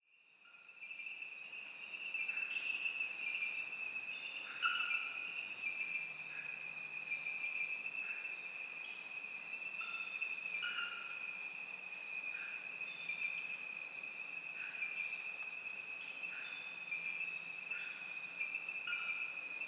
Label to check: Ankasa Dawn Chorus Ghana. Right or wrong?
Right